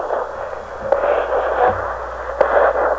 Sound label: drums